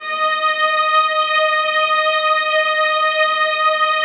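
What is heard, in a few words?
violas sustain d#5; Sonatina Symphonic Orchestra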